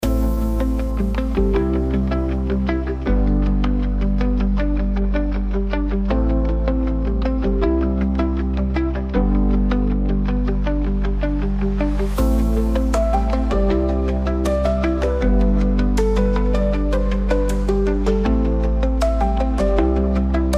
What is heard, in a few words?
background music